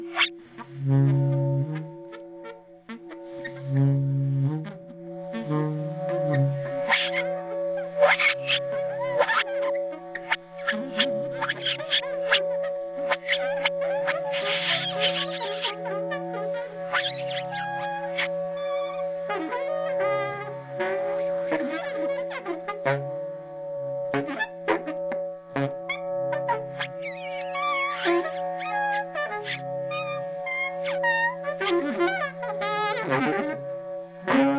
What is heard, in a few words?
sax blend remix